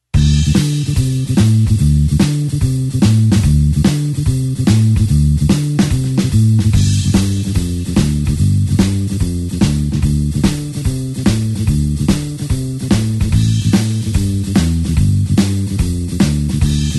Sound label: blues bassline shuffle D